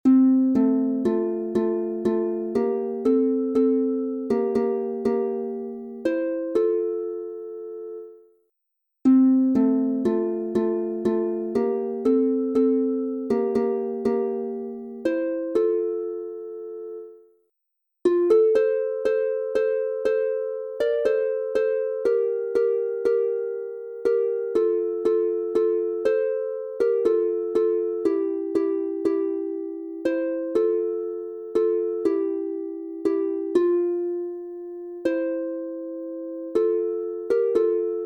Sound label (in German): Es ritten drei Reiter zum Tore hinaus F Dur 2 st instr, Harfe